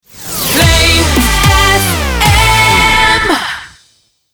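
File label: 110bpm A minor Rapid Fire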